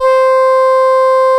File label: LAMBDA, String Machines